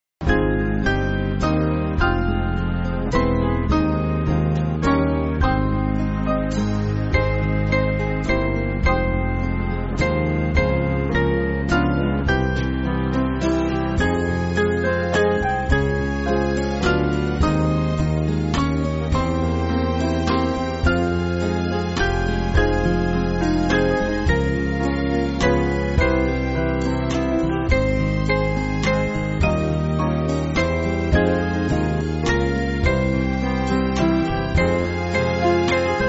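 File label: Band, Music Backing Files for Churches; God Of Our Fathers; Lest We Forget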